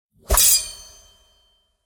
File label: sword